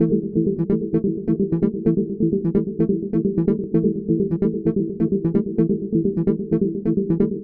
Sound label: dronal pitches; Loops; Electronic